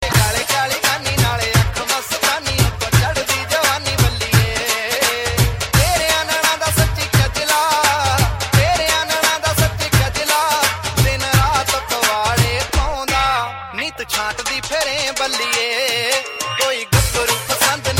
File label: punjabi ringtone